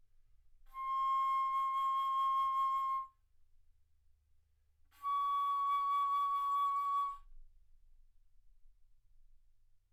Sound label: Bass Flute ff C6 Db6